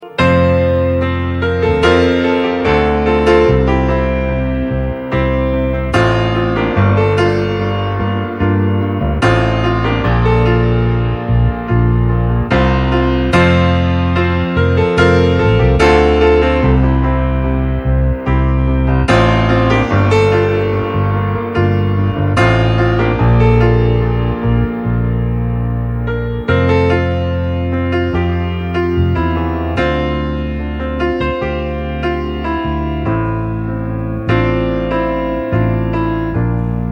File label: INSTRUMENTAL RINGTONE